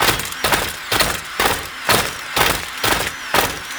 Melee Run Loop; Warframe; Necramech

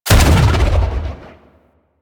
Rifle Shot; DE; Warframe; Weapons; Sentient; Sent Rifle New War

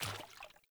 Sandals Walk Water; Characters; Player

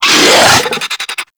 Infested Charger Attack, Enemies; Warframe

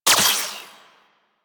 Guandao Pistol Shot; Warframe; Tenno

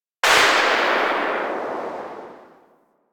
Igniter Pistol Tail; Warframe; Weapons; Corpus

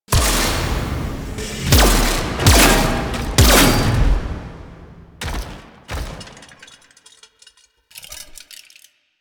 Mech Entrance; Erra

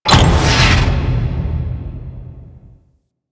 Switch Medium Stop; Ambience; Orokin Moon; Warframe

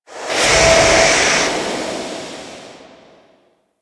Stream Blast C; Warframe; Ambience; Grineer; Grn Hanger

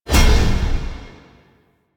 Rally Cast Peak; Warframe; Warframes; Hoplite

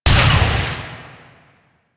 Shot Small; Weapons; Warframe; Duviri; Incarnon Adapters

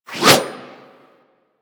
Axe Small Swing; Warframe; Weapons

